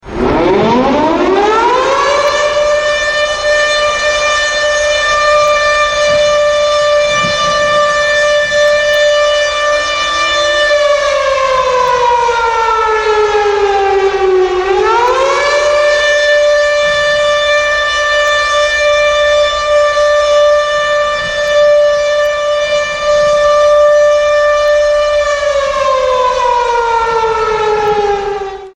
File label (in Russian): sirena